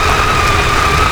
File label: tram engine; vehicles; Tony Hawk's Project 8; XBOX